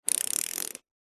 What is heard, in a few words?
leisure toy plane pull back motor clicks